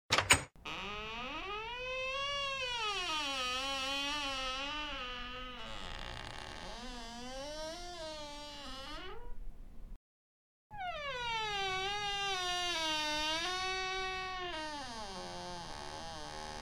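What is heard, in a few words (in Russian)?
dver otkryt skrip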